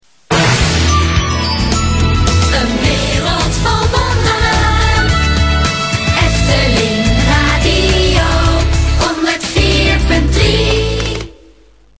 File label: jingle